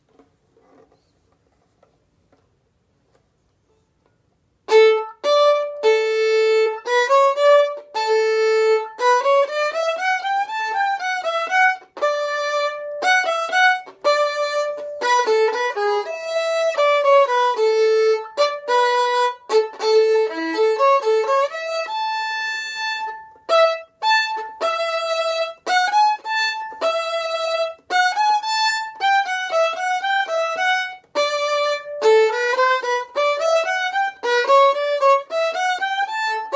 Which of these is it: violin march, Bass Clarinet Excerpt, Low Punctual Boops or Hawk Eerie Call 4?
violin march